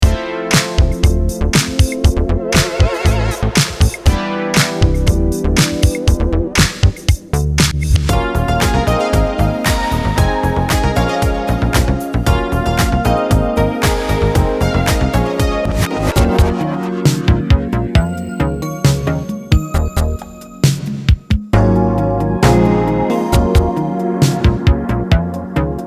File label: funk synth